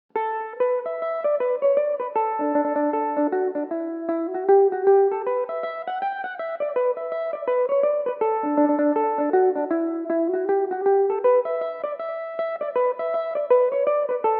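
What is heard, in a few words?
E dorian, Irish mandolin; ships are sailing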